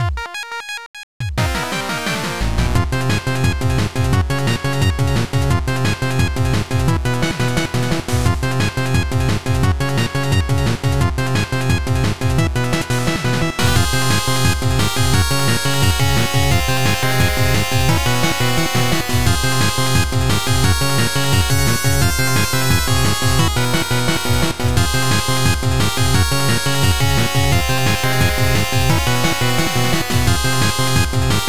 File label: Internal Percussion